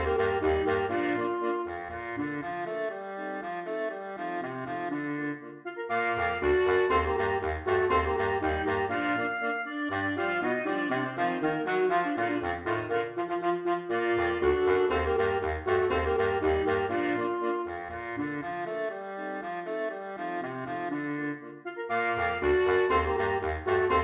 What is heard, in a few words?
The old grumbler sax quartet; FUCIK; Saxophone Quartet